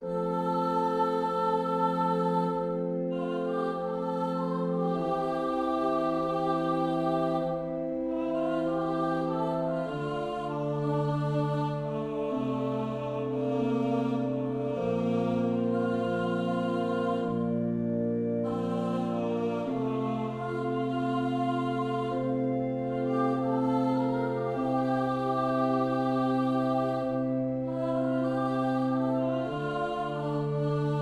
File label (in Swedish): Tro orgel in F m text